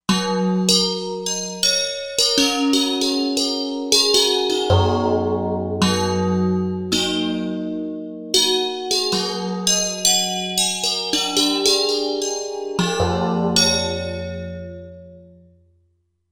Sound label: Clickbell